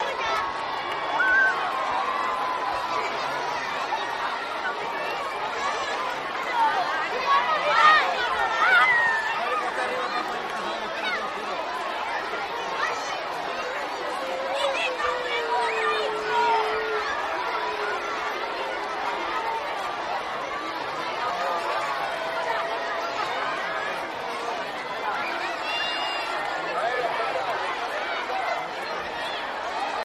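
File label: Children Group Happily, kids play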